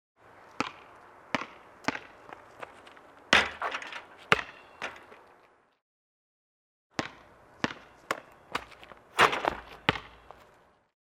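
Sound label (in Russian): баскетбол бросок мяча попадание в щит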